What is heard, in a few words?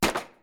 22 handgun; DuckShoot